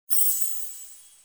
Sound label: xmas chime